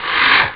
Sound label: swish; svencoop3